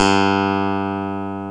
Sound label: Clavinet G2; USB Soundscan vol.09; AKAI; Keyboards Old School; CLAVINET9